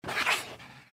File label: gecko claw atk vox; npc